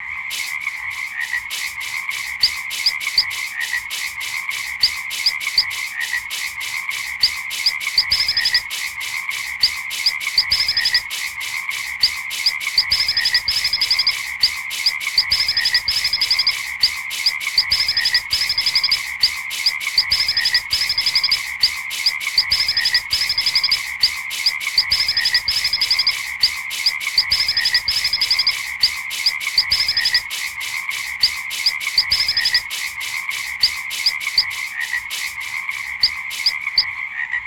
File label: Hylodes phyllodes